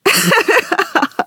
laughter, woman; Stalker Anomaly; human

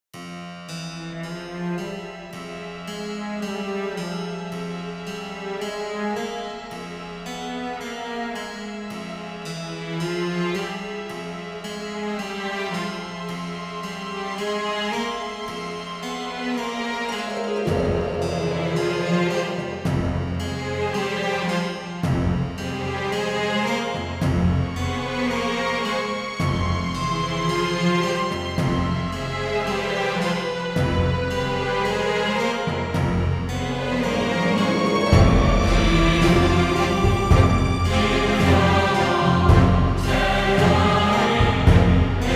prelude in F minor

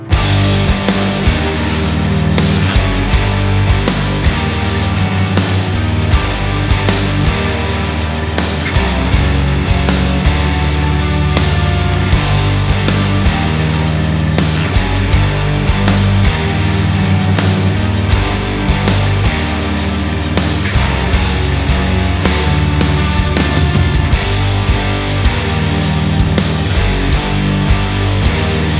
Instrumental Version

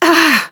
hit, human woman fight; Stalker Anomaly

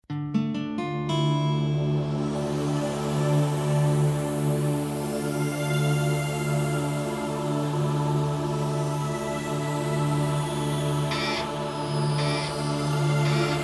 ambient; X-Bow Software; 2004 - Star Wolves

